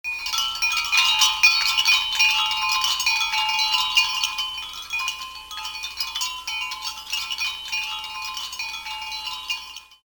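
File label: WIND CHIME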